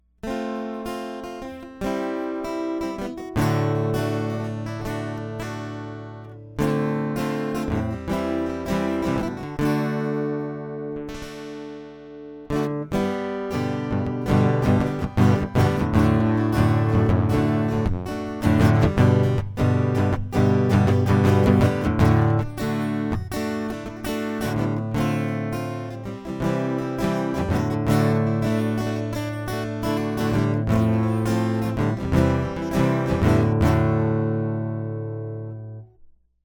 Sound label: acoustic; MegaEpix